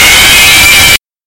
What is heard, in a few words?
loud screech noise